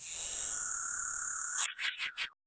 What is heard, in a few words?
realistic sfx of spider s, insects